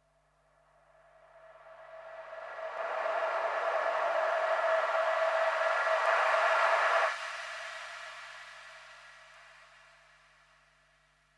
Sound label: snare mist